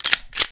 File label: mac10 slide back; garrysmod